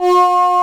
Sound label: CHOIR MIX PAD; SAMPLE WAVE MIXING 1